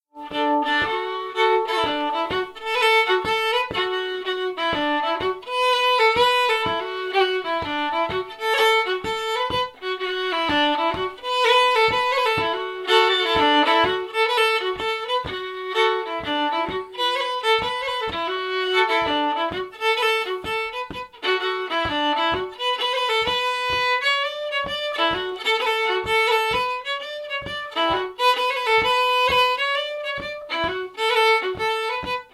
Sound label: Hop Jig